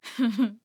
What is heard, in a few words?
Heh Heh; Skye; Paladins